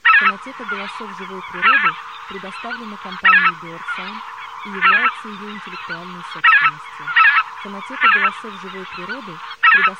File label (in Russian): Белолобый; OCA LOMBARDELLA